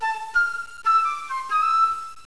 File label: FLUTE